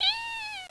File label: roach die; svencoop; biglolly coop